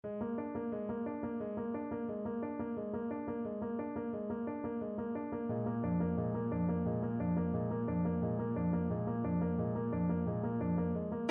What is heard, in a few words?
john henry, clarinet